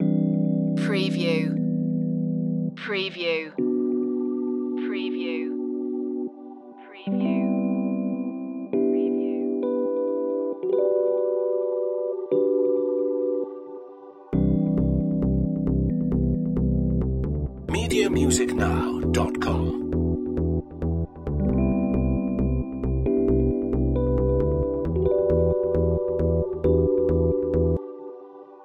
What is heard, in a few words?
ground pulsation loop